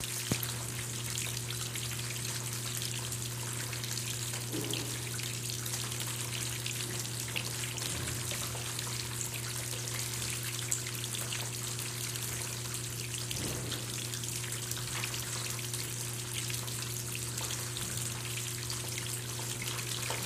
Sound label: fishpond